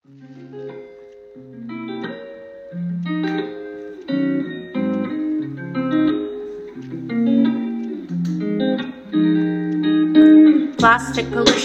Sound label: rap